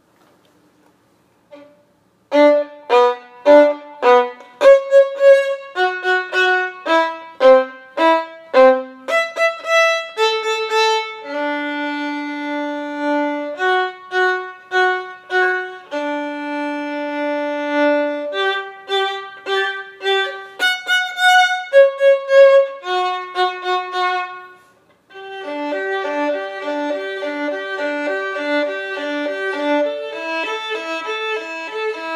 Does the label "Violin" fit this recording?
Yes